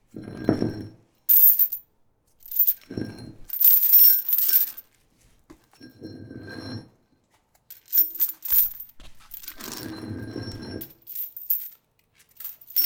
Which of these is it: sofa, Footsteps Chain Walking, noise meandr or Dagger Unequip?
Footsteps Chain Walking